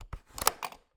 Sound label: Cabin, window, latch, flip, lock, stuck, release, open, wide; Eiravaein Works; Vaeyan IV